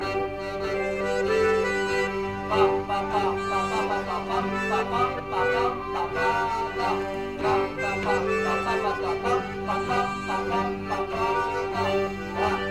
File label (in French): Rondeau (rythmique violons); Quand son paire l-a maridada